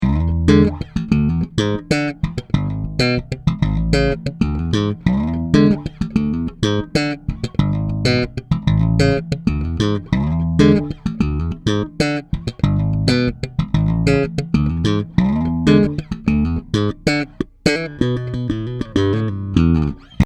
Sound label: slap